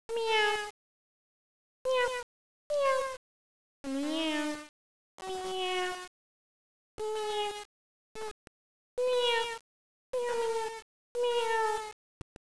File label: cute cat echo